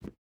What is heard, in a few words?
footstep rubber boots concrete jump start; Rust